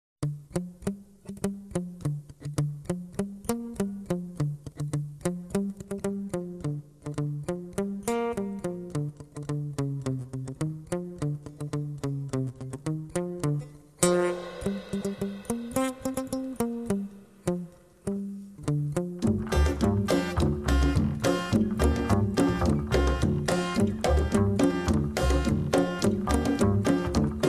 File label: Egyptian reggae